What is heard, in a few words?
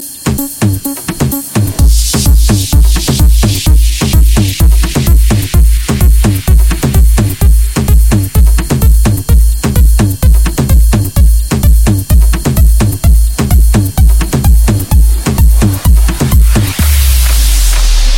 House Club Tone